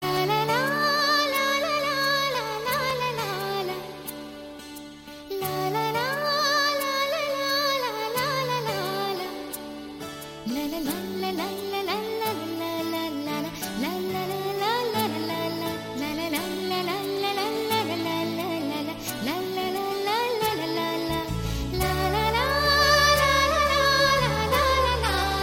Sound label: Hummin